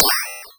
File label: METAL; EMU Orbit-3